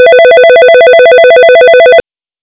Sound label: warble med